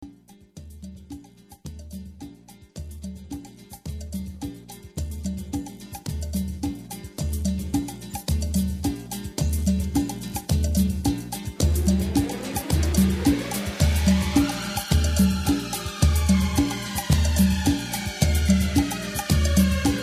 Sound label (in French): Accompagnement